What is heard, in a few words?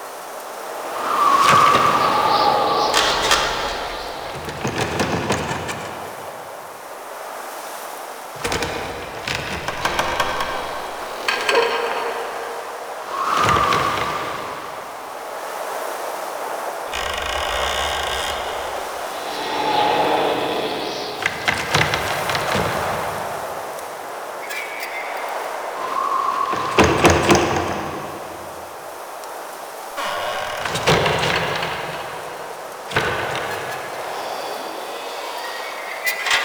Exterior Building Rattle Loop, Ambience; Warframe; Duviri